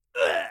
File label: male death